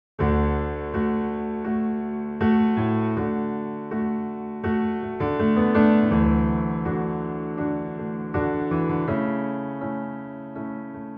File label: D piano